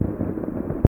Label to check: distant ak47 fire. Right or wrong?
Right